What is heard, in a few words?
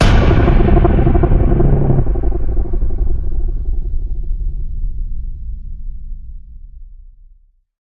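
creature growlin; Showdown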